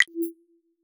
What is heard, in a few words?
ui teleport menu back